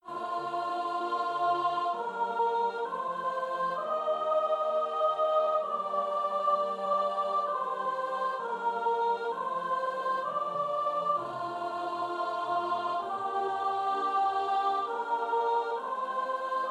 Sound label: Psalm soprano